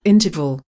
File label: interval